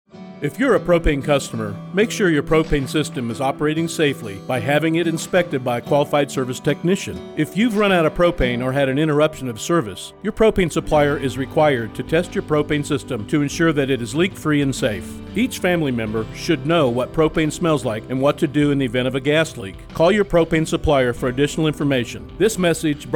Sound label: PERC w music